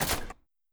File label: click click